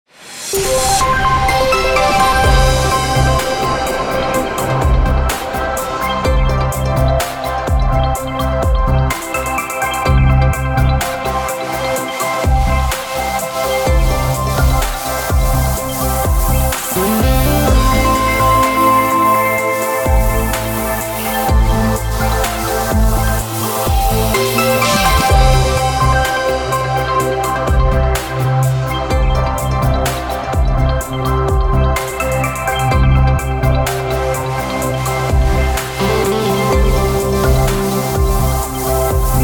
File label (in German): Wetter Bumper und Musikbett easy; RADIO 8